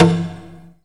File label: VERBDRUM, FX PERC